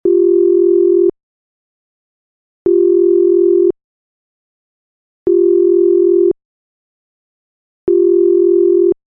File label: call Ringback